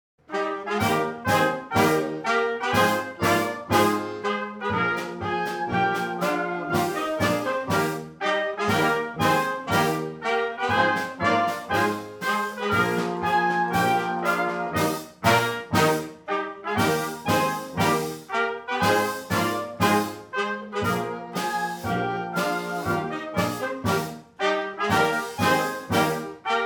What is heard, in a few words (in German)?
Schottisch